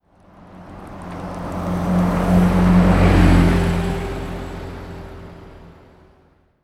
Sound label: bus